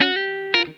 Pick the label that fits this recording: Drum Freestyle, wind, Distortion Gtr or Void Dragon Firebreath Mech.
Distortion Gtr